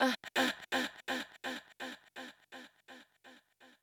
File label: VOICE GRL HOUSE 125